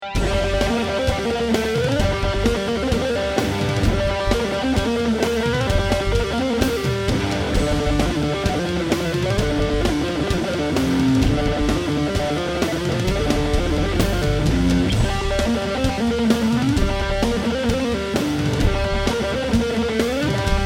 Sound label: solo